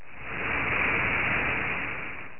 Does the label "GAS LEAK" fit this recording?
Yes